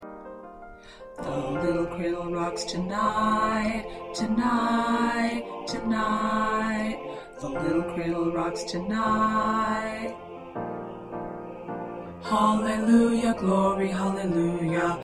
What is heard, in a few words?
Baritone 2